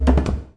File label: Failed Trick Chain